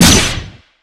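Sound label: Battle Dagger; Etrian Odyssey III HD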